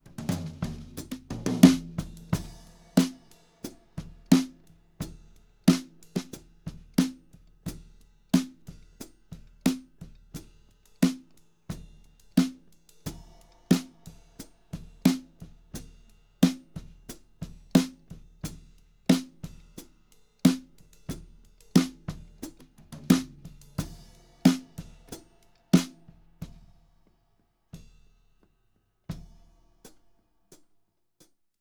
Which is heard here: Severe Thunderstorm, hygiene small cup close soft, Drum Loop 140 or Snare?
Snare